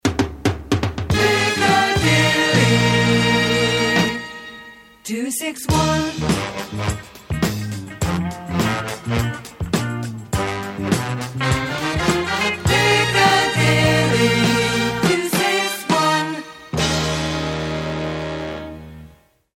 jingle; piccadilly radio 261

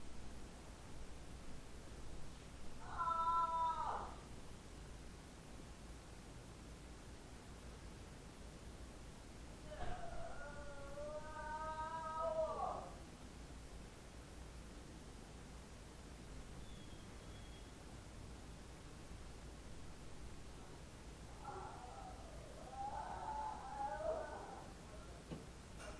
hospital emergency section

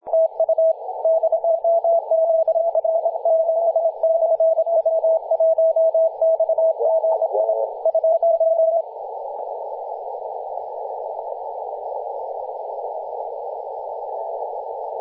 10.118MHz CW; zl7; w1xgi

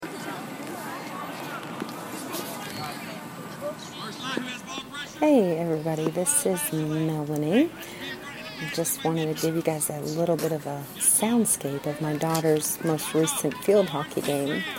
field hockey soundscape

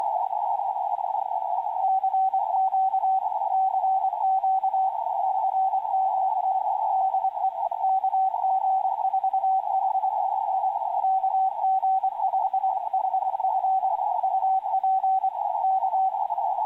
guantanamo bay on 160m cw

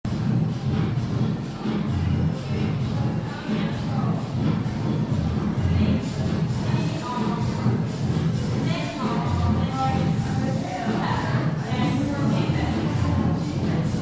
music on the toilet

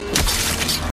melon smashing

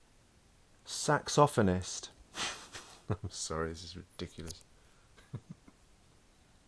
saxophonist

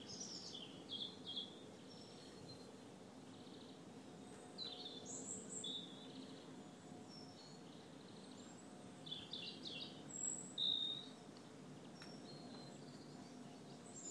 spring is here birds are singing

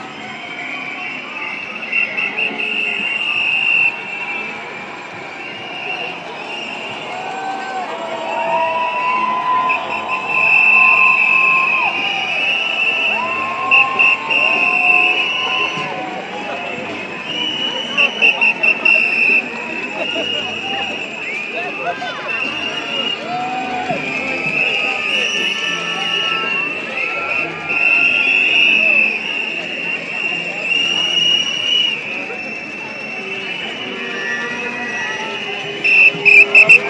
cuts march; embankment march 26

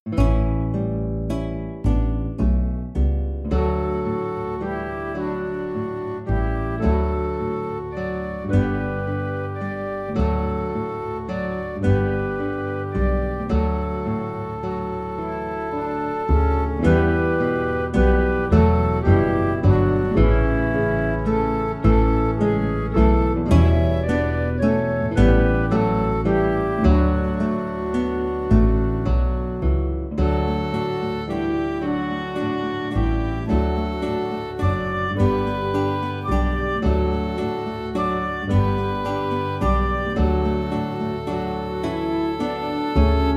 backing track

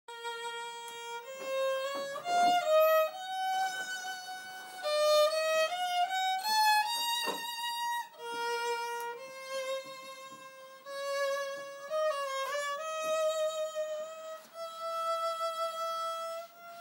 try out on 3D printed violin

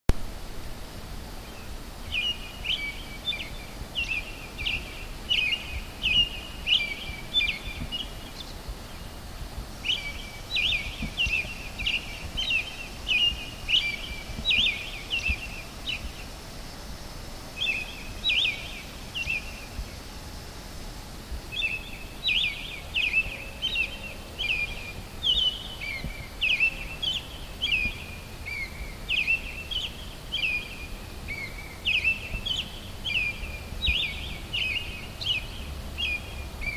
American robin dawn song

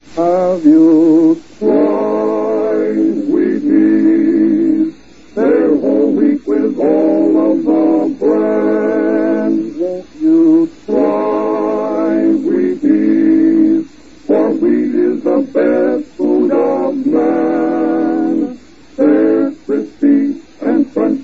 wheaties the first sung jingle in a radio ad

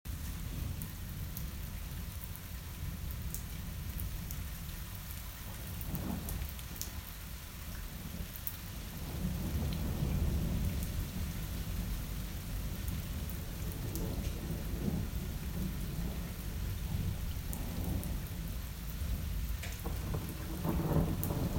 thunder lighting in marrakech

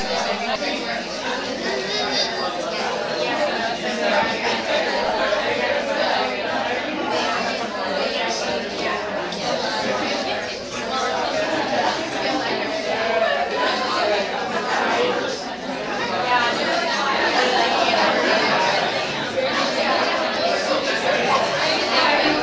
crowd

